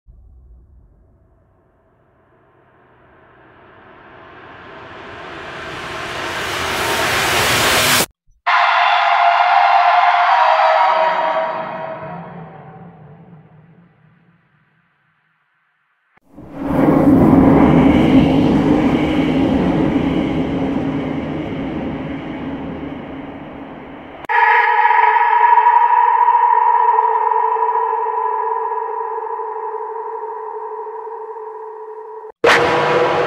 suoni horror nel film weapons sound horror scary bhoot creepy